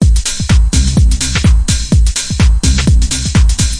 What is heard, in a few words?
128 bpm house with subtle ba, drum loops and breaks